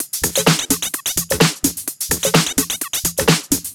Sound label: Houseloops 128BPM; Vengeance Samples